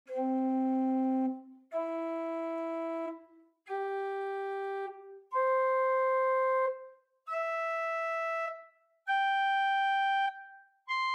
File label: Prinzipal 2 arp, organ; Grace Lutheran, Champaign